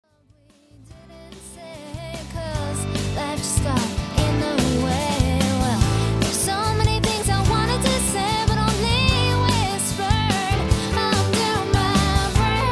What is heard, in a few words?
Rock Track